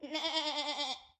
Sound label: Sheep